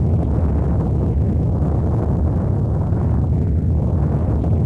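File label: Ship steady loop; vehicles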